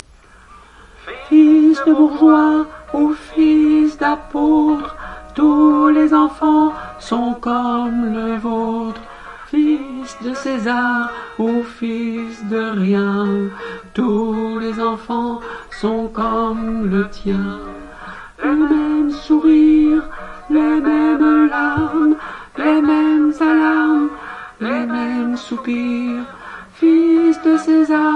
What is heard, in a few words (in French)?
hommes, chorale